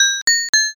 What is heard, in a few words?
pay